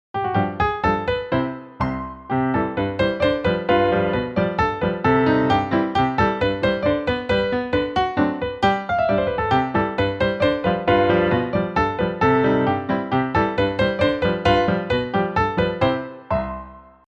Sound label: Polka